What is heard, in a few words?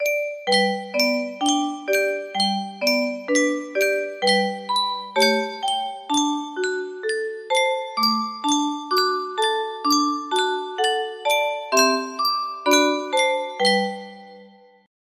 yunsheng tune music box, music box melody